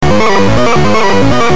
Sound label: depressing rock music